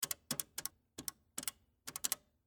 Pay Phone Dial, Technology, Telephone, Dial, Pay, Phone; Telephones; BlastWave